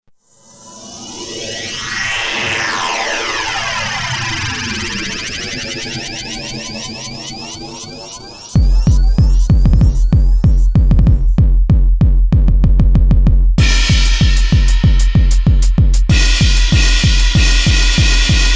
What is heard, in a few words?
Happy Hardcore; Kneuterkamp